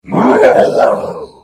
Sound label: Hit; The Elder Scrolls III - Morrowind